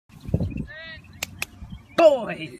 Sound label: boyyyy